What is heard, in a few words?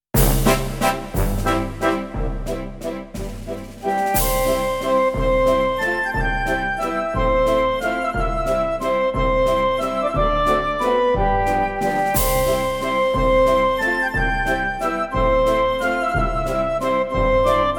v waltz